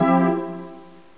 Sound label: Vox Beep; Soldiers 2